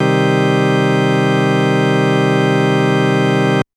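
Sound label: Organ Synth minor; TrashLibrary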